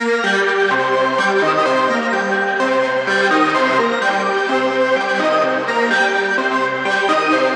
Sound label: MELODY LOOP PLUCK A 127 BPM AMBIENT RNB